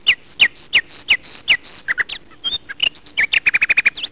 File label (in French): rossignol; sons nature